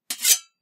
sword Metal; Foley; Kenney's Sound Pack

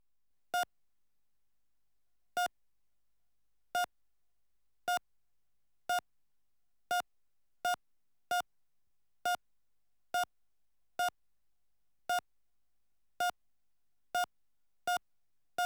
Pulse monitor erratic, Slow dow; Hospitals; BBC Sound Effects Library

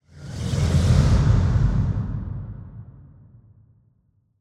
Cinematic Transition; SoundBits; Cinematic Hits Transitions

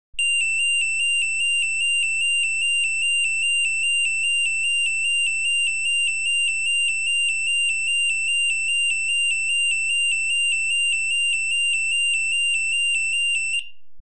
Alarms, Car Alarm; BBC Sound Effects Library; Explosions Guns Alarms

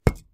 stone hit; Kenney's Sound Pack; Foley Sounds; Rocks

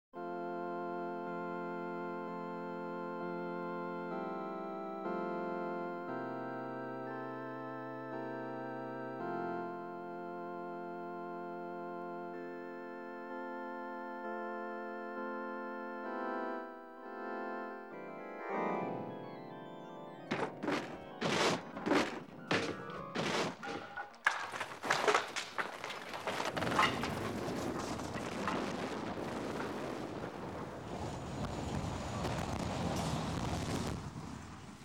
Church Organist; BBC Sound Effects Library

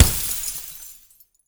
Impact on Windshield with Debris; Fullmix; Red Libraries; The Windshield

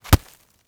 snowball impact hard; Foley Elements Ice and Snow

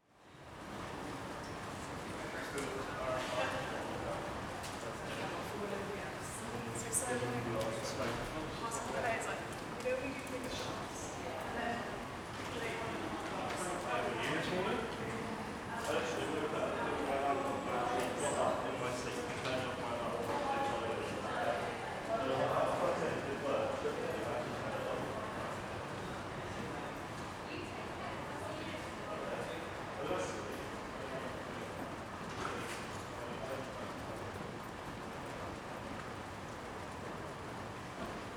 University Concourse Busy Walla; NoiseCreations; Aura

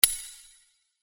Sword Sound; FXHome; Fighting Sounds

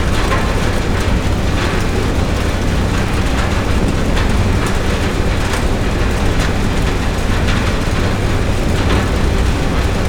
Engine and Rock Rattle Hard, Cement Factory; Double Trouble Audio

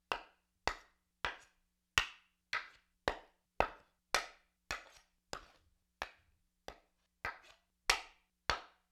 Seax Spear Blade on Haft, Weapon on Weapon; StillNorthMedia

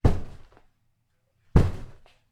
Cardboard box kick; Matt Sherman; Gift Of Junk v1

